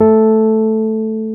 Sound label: WURLY A2; E-MU Formula 4000 Series Vol. 3; Analog Odyssey